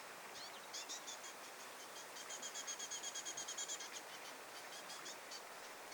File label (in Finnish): hernekertun poikanen kiihtyneena